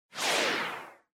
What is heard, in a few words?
Motion Speed Lines Long